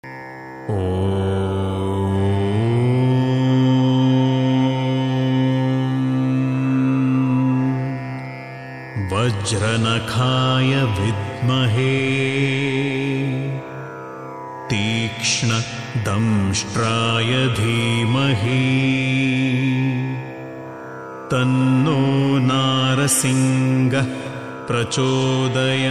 gayatri male; narasimha

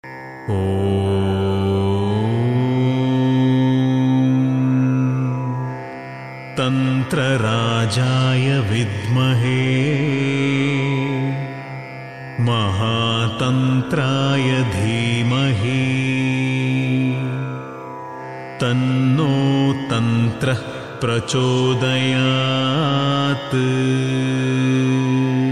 tantra gayatri

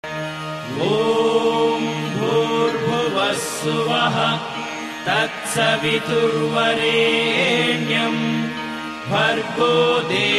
gayatri (savitri) mantra male voice

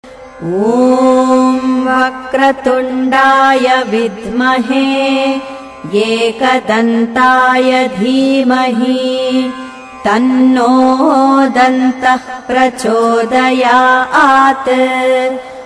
gayatri female; ganesha (ekadantaya)

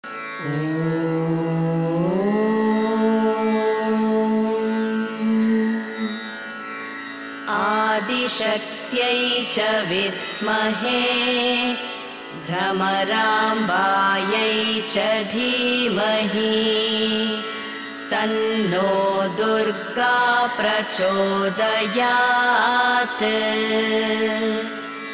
bramarambika durga gayatri

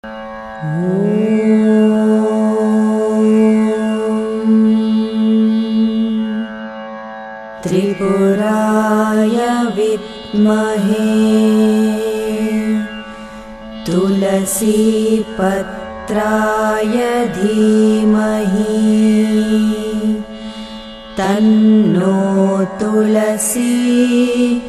tulasi gayatri female voice

